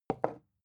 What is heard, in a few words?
glass put down heavy slow; objekte